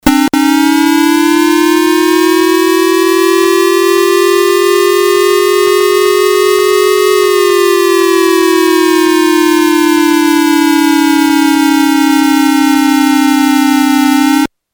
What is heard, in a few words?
siren